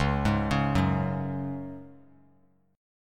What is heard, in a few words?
C sharp Suspended 4th; arpeggiated; 13,15,16,16; 3C,3F,3A,3D